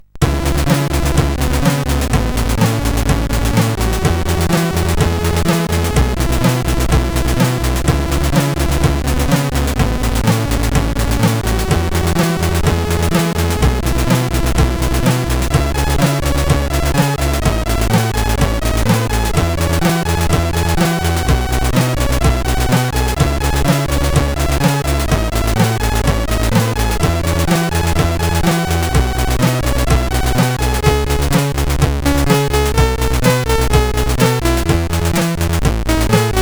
Peace, CSG8580R5; hvsc

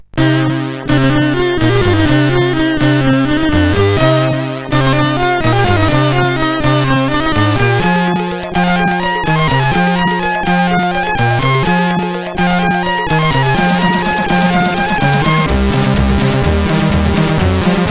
sid CSG 8580R5

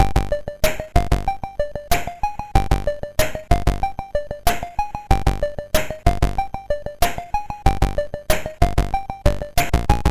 Teleporter, sid MOS6581R2

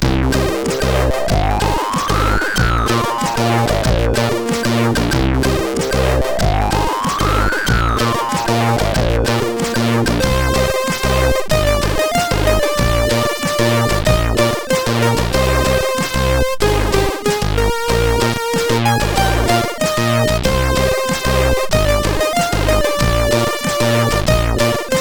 Feeble sid CSG8580R5; hvsc

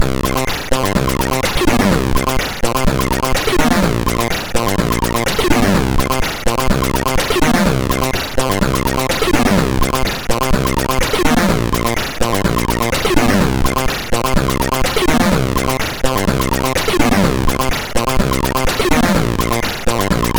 Style Loop, MOS6581R4

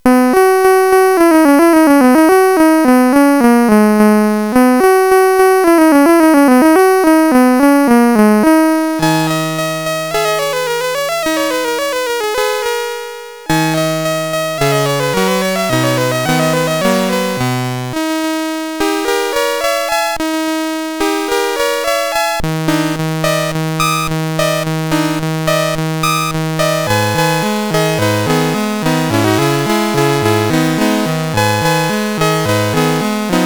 Revenge of Mutant Camels sid CSG8580R5

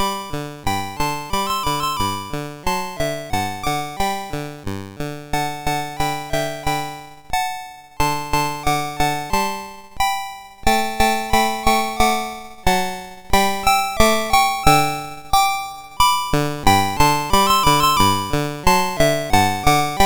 Kling Gloeckchen, MOS6581R2

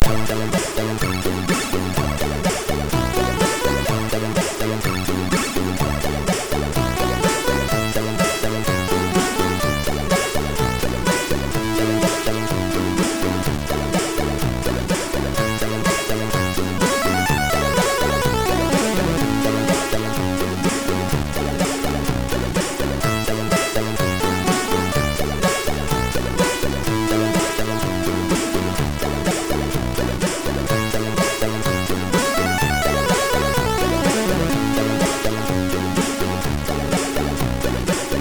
Sound Demo, sid MOS6581R2

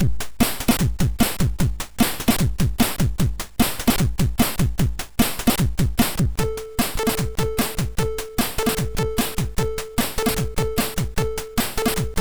Ting ting ting, MOS6581R2

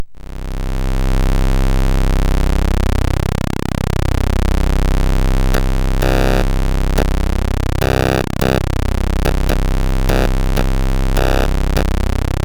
Beats tune, sid MOS6581R4

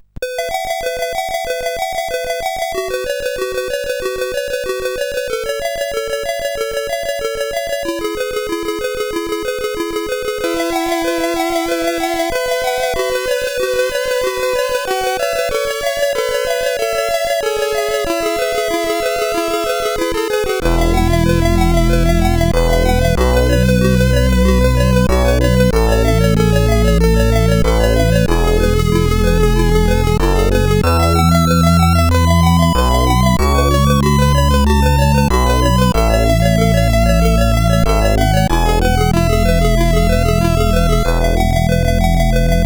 Bells, sid CSG8580R5; Gero

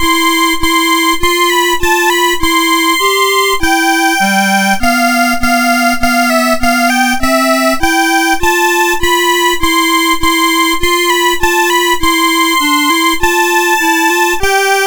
Duet, MOS6581R2; Graduation Match

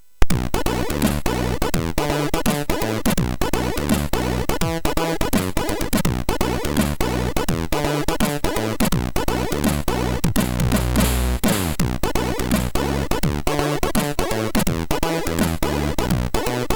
Funk Bass, MOS6581R3

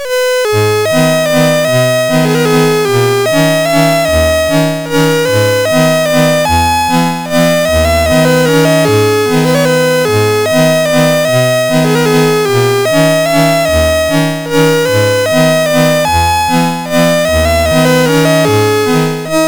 Shubert Waltz, MOS6581R2

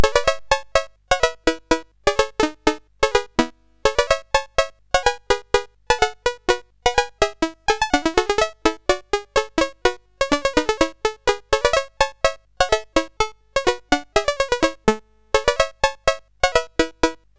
CSG8580R5, GAMES; WitchSwitch

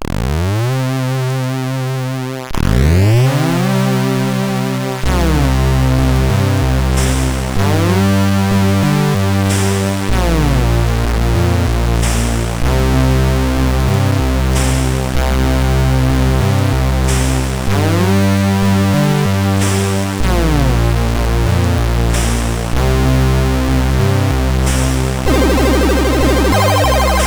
tune, CSG8580R5; Magic 2 tune 2

